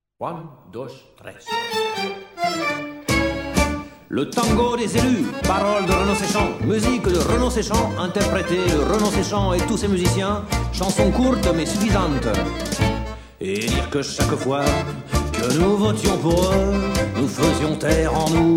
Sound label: tango